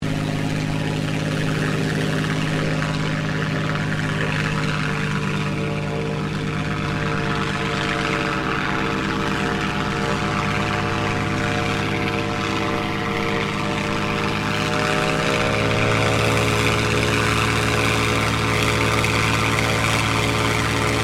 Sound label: AIRCRAFT CAC CA-18 MUSTANG MK23 OPERATING ACCELERATING STEREO, WW2 Mustang Fighter Plane; Aircraft Cac Ca-18 Mustang Mk23 1950; Airplanes